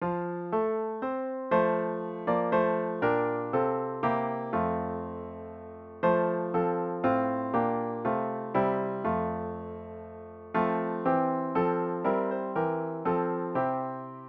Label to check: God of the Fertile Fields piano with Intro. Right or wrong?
Right